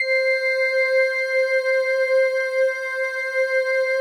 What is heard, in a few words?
PAD, DIGI-PADS